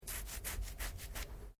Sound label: MALE SCRATCH ARM; Miscellaneous Human (Sound Effects:Human)